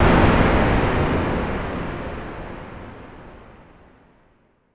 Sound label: noise fx; Masterbits; Switch FX